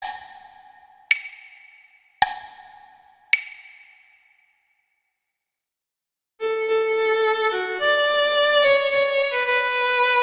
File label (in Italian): Andante Guitar; Paganini; Sonatas Op1 Vl Gu; Sonata No 3